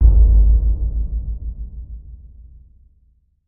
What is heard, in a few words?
Bass Impact Low Drum; impacts